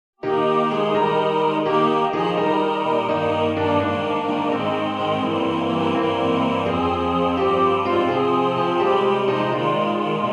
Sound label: jazzy version